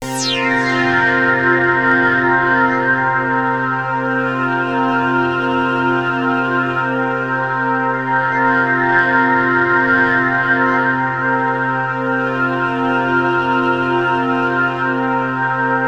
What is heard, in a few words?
Access Virus B; Synthworx